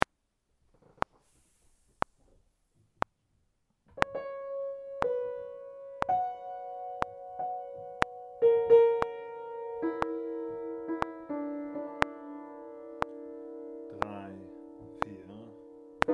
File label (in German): Stimme